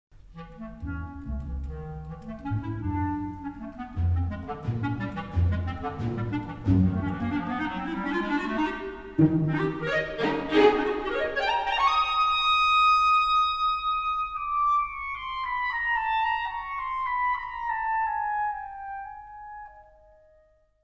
clarinete

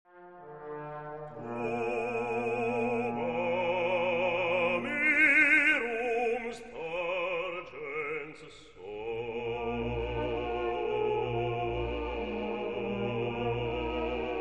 BAJO

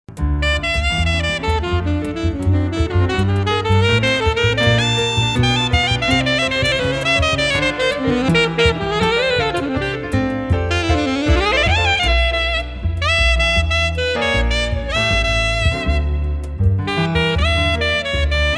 saxofón

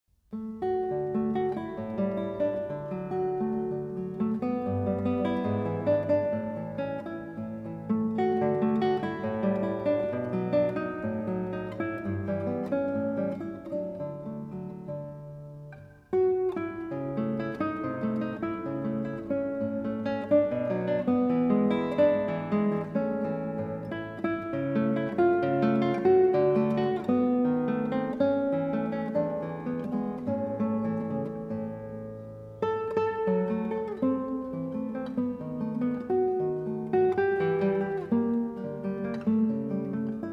guitarra

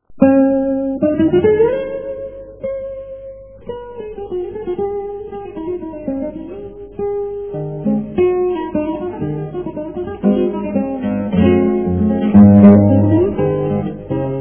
guitarra

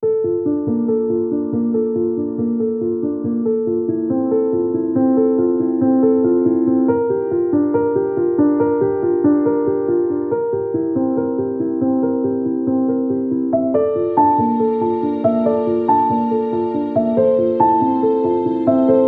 Música de Fondo Tranquila

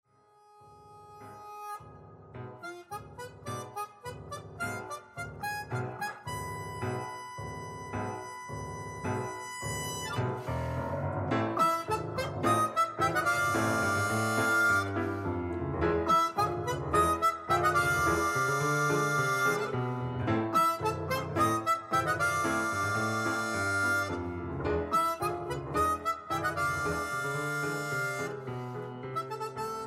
armonica

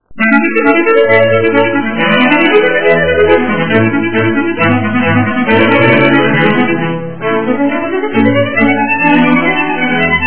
Clarinete

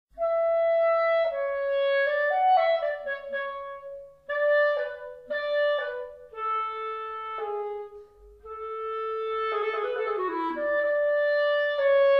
Clarinete

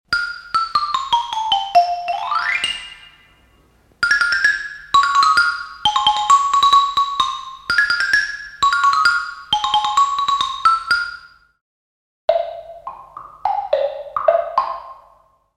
xilófono y bloques de madera

